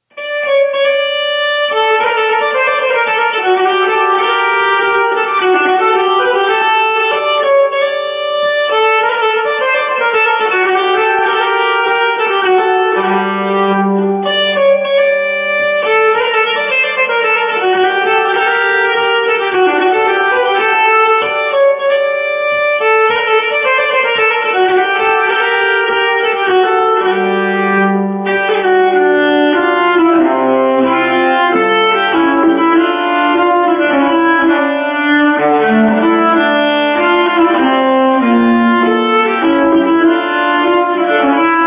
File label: Polska Dalarna, nyckelharpa